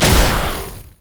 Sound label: flame breath; LoL; shyvana